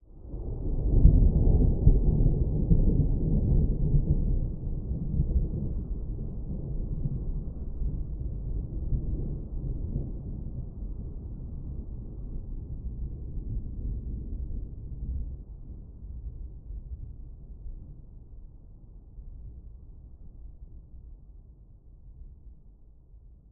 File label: Thunder distant long rolling; boom library; Thunder And Rain